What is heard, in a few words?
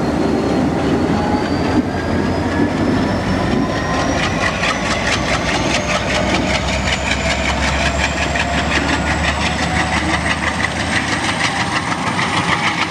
EMD vs Alco